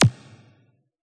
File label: hi q; AnlgKit1